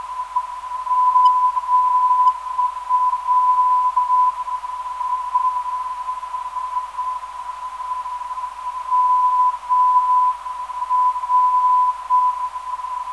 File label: 770kHz